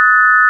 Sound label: sine; ものづくり基礎工学（情報工学分野）; 音の波形と分析